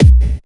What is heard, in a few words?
KICK hardcore, Techno Trance DRUMS